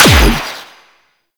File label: Air Pistol 2D Shot; Crp Air Pistol